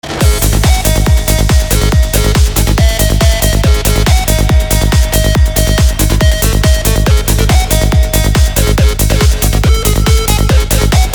techno remix

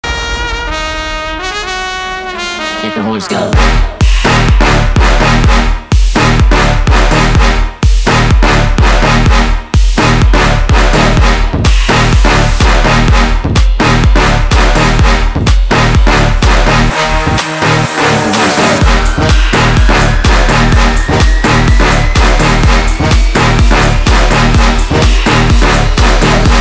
horns

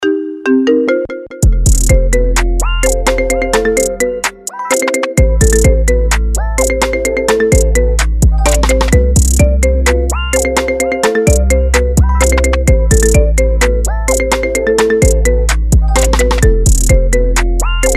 latest iphone ringtone

